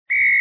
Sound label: Quiz Incorrect